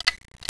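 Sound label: Retina Ib, camera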